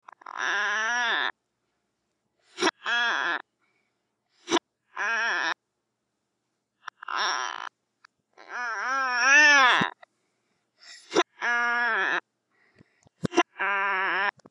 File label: baby crying